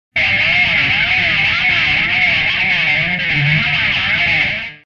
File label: Music effect - Pick being scraped randomly on electric guitar string; Hollywood Edge Sound Designers Tool Kit